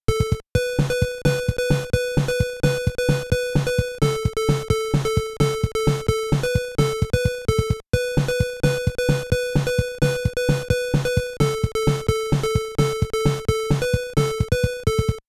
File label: victory